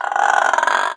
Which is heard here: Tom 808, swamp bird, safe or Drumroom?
swamp bird